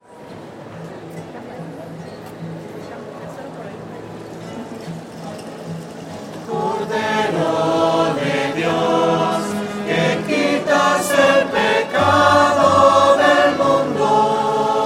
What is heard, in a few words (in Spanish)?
cordero de dios voces; misas 2012